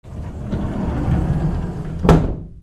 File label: DOORS